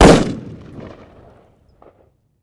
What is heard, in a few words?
Gun AR-15 Single Shot Fire Quicker